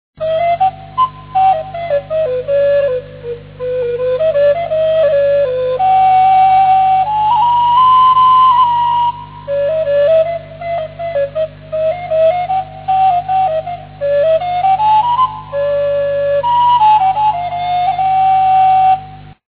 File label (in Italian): flauto; inverno